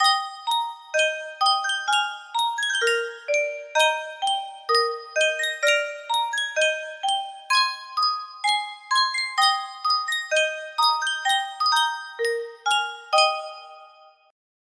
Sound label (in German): Spieluhr, music box melody